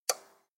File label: clock; Mermaid Barista Latte Art